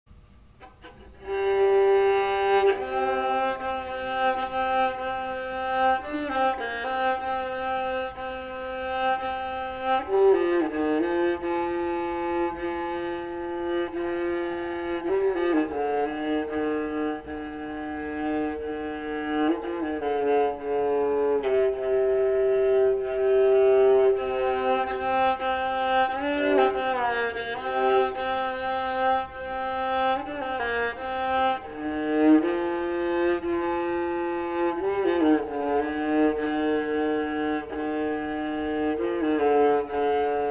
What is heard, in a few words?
rebec